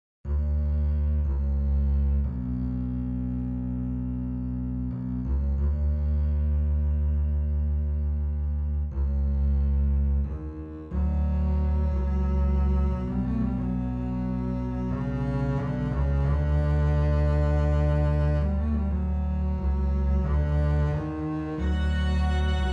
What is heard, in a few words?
strings double bass; captains strings